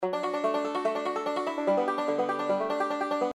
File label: roll Up; Dynamite Digger Eureka